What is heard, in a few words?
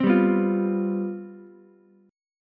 C Major 7th Suspended 4th, down, Ukulele; strummed